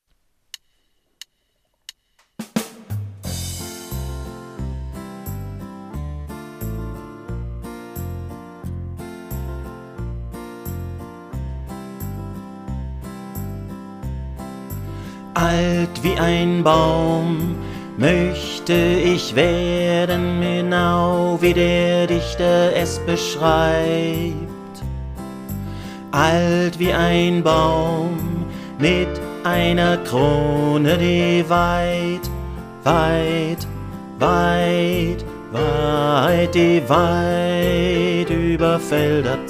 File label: bass